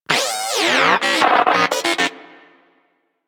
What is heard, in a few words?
Moa Inquisitive Vocal Stomp; Warframe